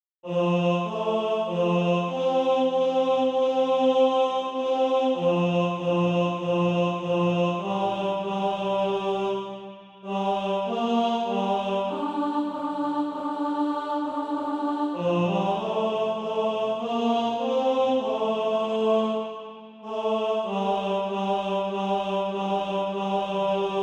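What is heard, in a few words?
tenor, Practise Music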